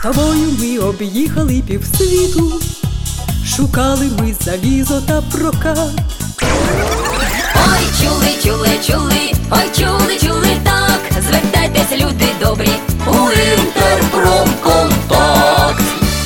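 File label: vocal, Ukrainian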